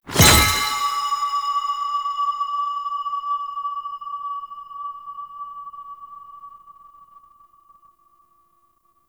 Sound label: Crystal Cluster Spawn, UI; Citrines Last Wish